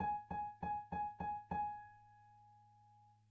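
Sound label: Theme F minor Ab; DeadFright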